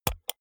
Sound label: computer mouse wheel click hard